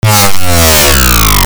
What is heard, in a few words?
ALARMS